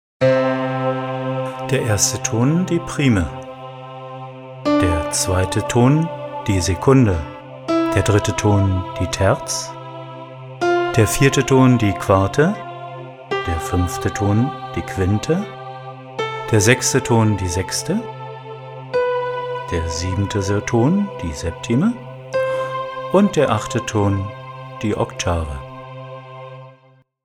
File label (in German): Intervalle in C Dur